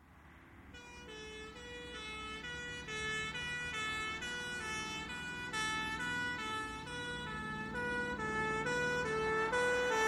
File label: Fire engine arrives with two-tone horns, idle, off; Emergency Vehicle Sound Effects; BBC